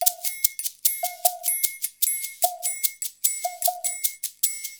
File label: Modern Percussion Loops; Soundscan vol.56; AKAI